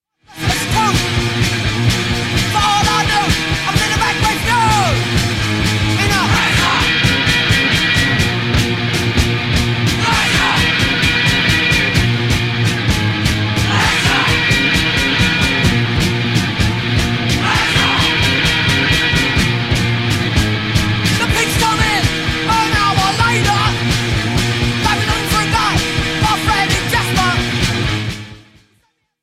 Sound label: police car